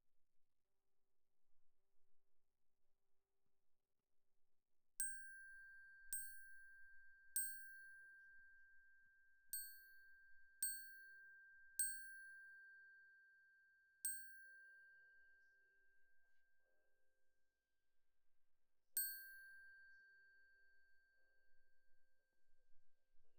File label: Glock Close